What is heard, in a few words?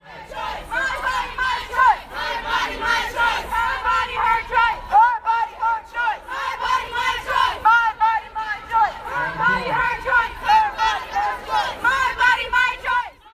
chant